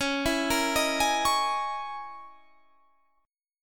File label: Db Minor 6th Add 9th Db down Guitar Standard; Dbm6add9 arpeggiated; JGuitar